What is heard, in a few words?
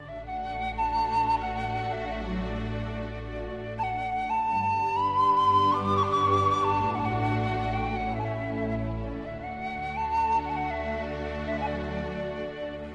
flute; shire